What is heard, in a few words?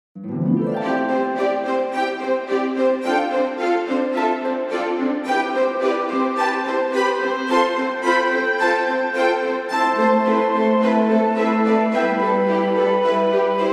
classical park; musicfox